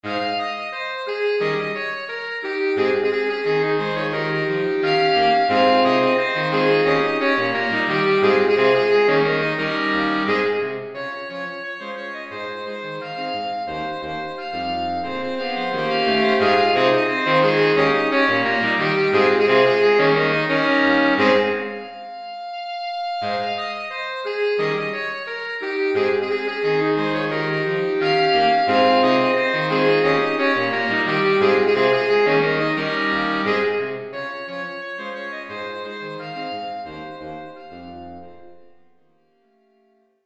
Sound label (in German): saxophonquintett